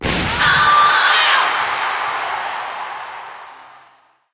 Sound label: bonus game Vocal; netent